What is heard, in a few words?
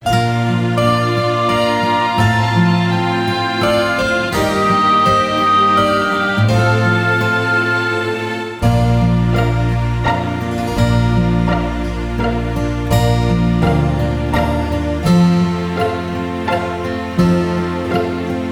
Trombone to play along; Guten Abend gut Nacht